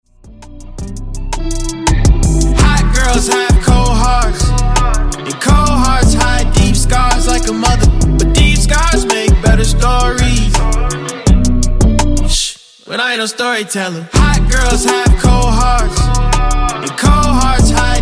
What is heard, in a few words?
Dj Intro Outro 83 Bpm